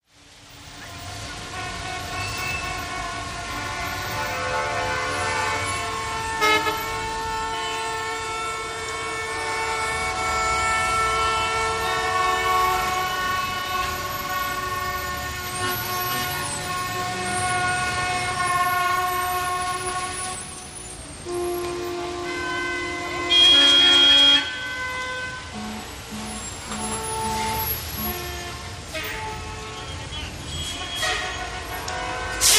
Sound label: Heavy Traffic Jam; big city